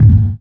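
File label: crash kick, Classic Hip Hop; STYLE KITS; Classic Hip Hop Mega Kit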